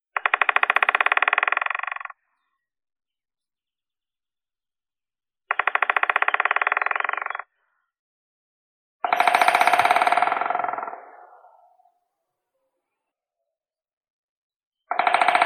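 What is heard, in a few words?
white-backed woodpecker, Dendrocopos leucotos; dyatel belospinnii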